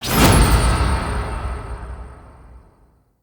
UI Mission Complete; Player Ship; Railjack

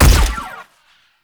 Shot Fire; Warframe; Weapons; Acceltra Prime

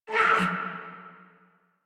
Pain Crewmen; Warframe; Corpus Crewmen; Crewman D

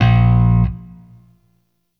Sentient Shawzin Oct One A Sharp; Warframe

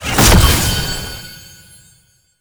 Brimstone Rune Pick Up; Roathe; Warframe; Tau; Abilities

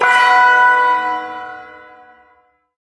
Shawzin Chromatic Chord; Chromatic Chord L; Warframe; Duviri Ersatz Shawzin; Chords

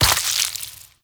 Vial Ability Viral Shatter; Warframe; Warframes; Alchemist; Elements

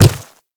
Quadruped Medium Walk Dirt, Footsteps; Warframe; Infested

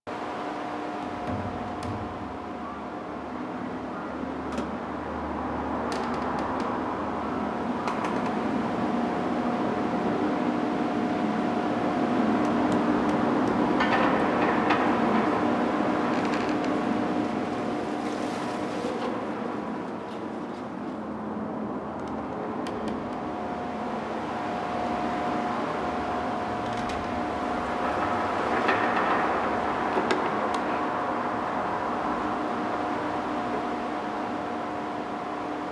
Tailor Shop Small Bed Loop; Nineteen Ninety Nine; Interior

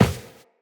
Run Default; Tenno

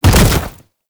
Bodyfall Light; Warframe; Lasrian Soldiers; Foley

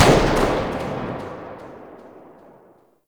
Grineer Emplacement Tail; Weapons

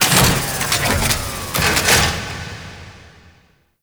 Cannon Battery Retract Down; Warframe; Railjack

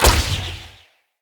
Hunter Bow Ranged Shot Charged; Weapons; Player; Envoy; Bows

